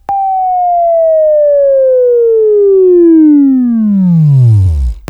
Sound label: Buzz; Labview-Program; Sweeping Training program